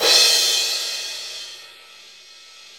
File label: CYM; Real-Kit s